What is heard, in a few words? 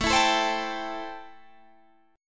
C 6th A, chord